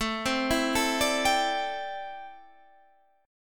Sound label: A Minor 11th A, Chord